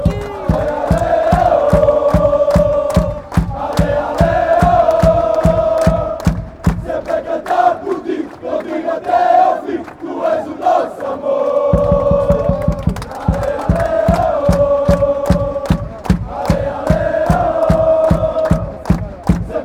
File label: allez allez woah, fanchants